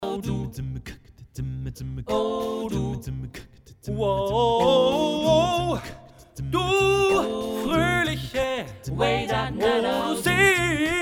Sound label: Tenor Solo